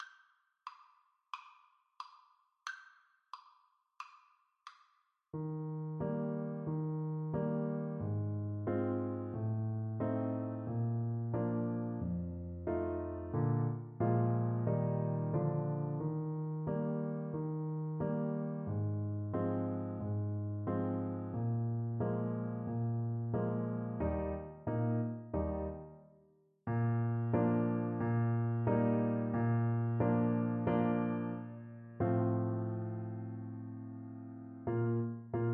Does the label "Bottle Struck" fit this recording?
No